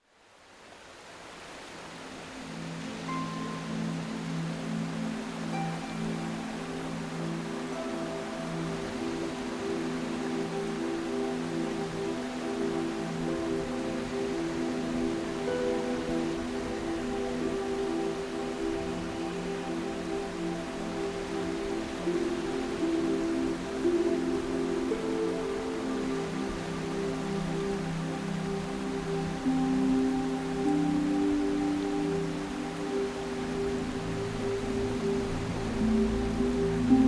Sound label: Relaxation Music, Relaxing Beach